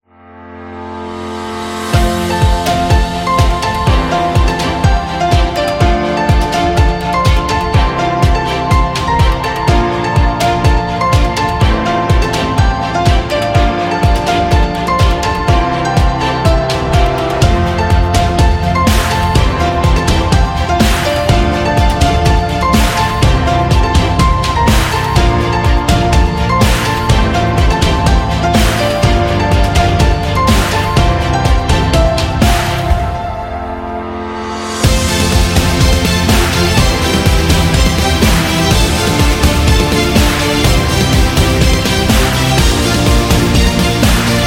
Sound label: Epic Business